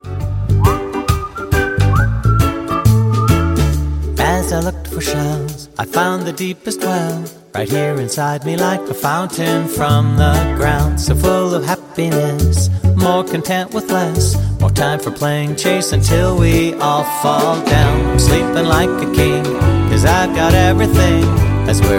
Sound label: Happy Beach